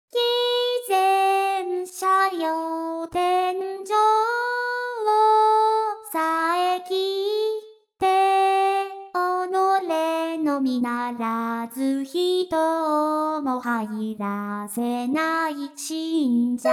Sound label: fugue in e minor incomplete; umezawa sadayuki